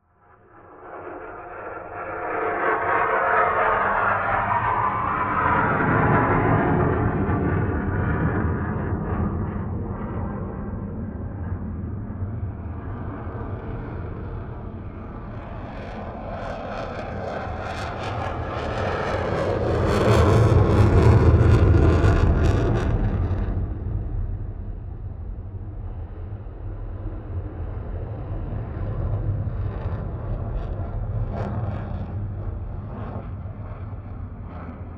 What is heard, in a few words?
f-16 experimental addition